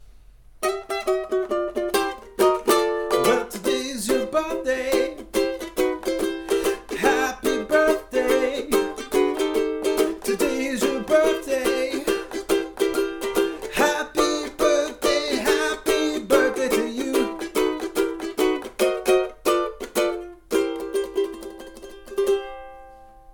Happy Birthday in English Ukelele

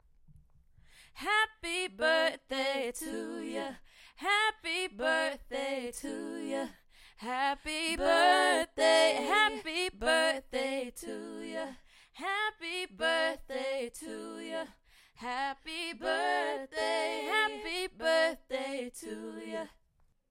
Happy Birthday Song in English Gospel

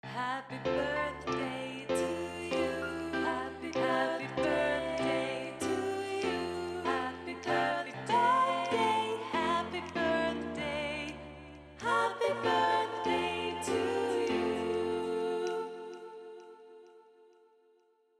Happy Birthday in English piano; Happy birthday song english piano

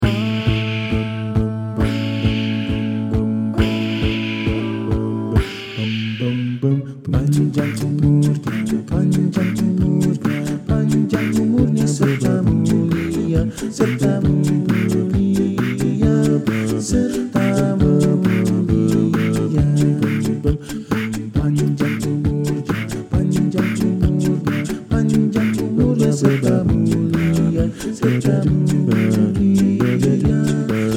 Happy Birthday Song in Indonesian

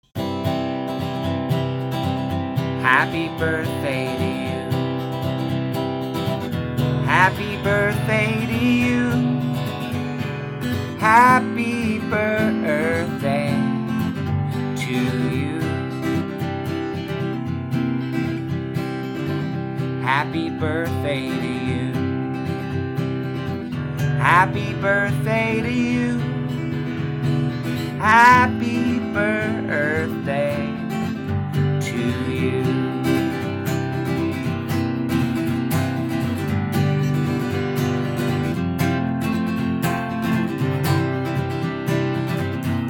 Happy Birthday Song in English Guitar

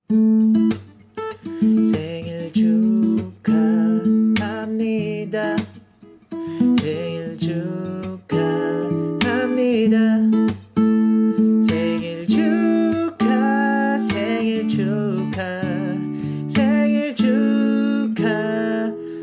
Happy Birthday Song in Korean

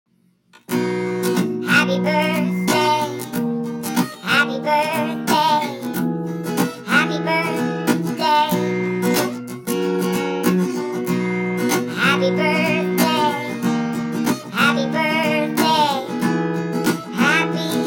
singing happy birthday song in english; Minion

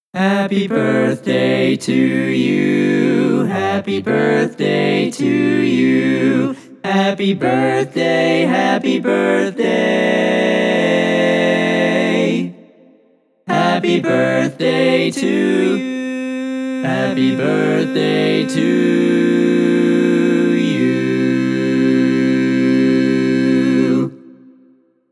Happy Birthday in English Barbershop

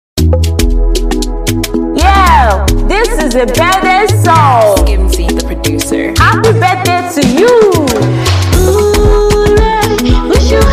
Happy Birthday Song in English Afro Beat

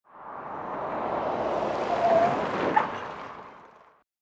Car approaching then skids

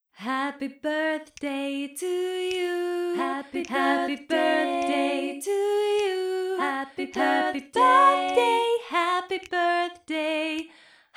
Happy Birthday Song in English

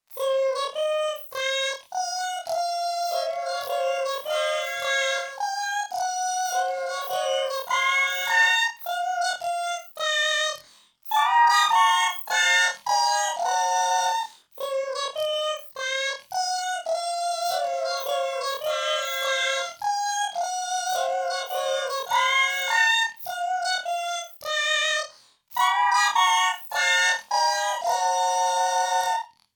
Happy Birthday Song in German Chipmunks

